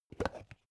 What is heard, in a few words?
plastic bowl open short